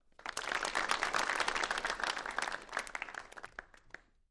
Crowd Short Polit, small int

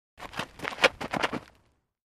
Basball FS Runner, baseball; baseball fx & games

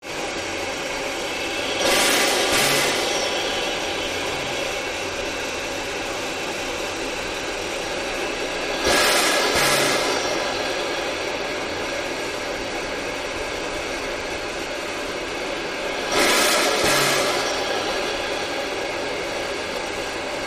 Steam Blow Down Multi, steam train

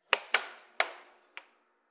Air Hockey Puck Hits

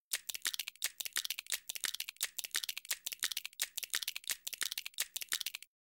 Bone Rhythm Loop Dry, cartoon gadget fx

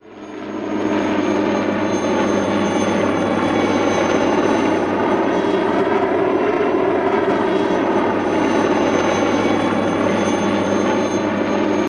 Jet Heli Fly Over Rev, jet ranger

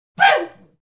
Baboon Chirp Single

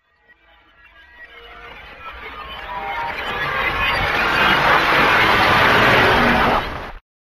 Reverse Glass Sting

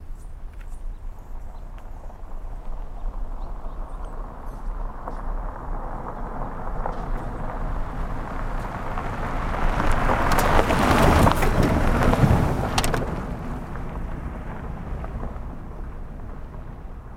Car Pass By On Dirt, tires

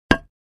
Patio Square Impact, concrete & brick

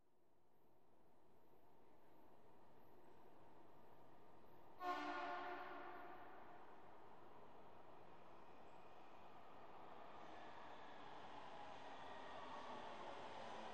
Subway From Distant

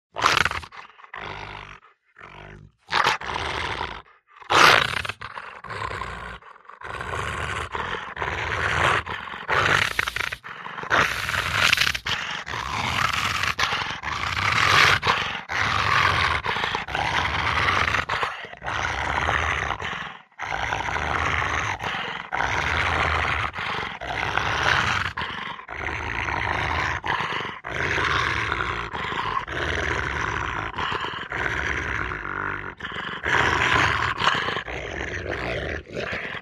Dog Rottweiler Growl

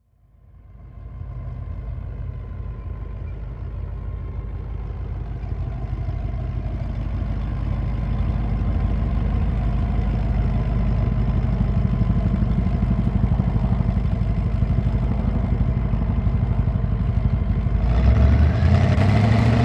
APC Stop Idle Rev Away, armored personnel carrier

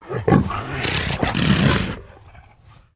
Leopard Snarl Inhale; big cats

